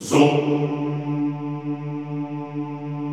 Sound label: ZOOMMM D2, Classical Choir; Best Service; Extended Classical Choir